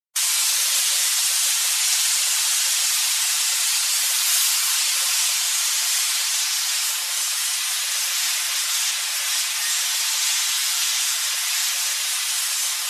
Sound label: Depleting Oxygen tank with hiss